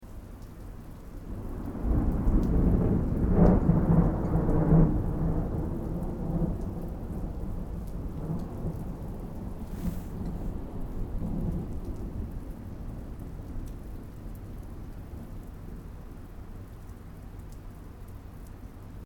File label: THUNDER DISTANT STEREO